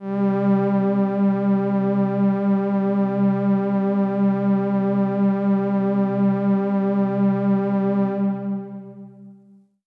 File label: Pad C, Synth Prophet One Shots; Electronisounds